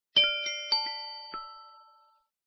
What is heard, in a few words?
Respiration